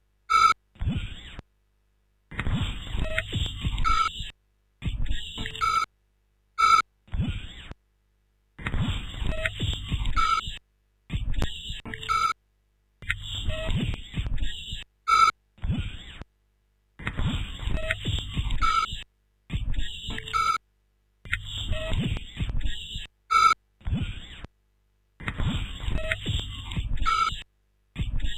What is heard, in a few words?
stereo filtercut loop; rauschpartikel